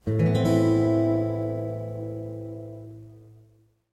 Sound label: a min9 open, guitar chord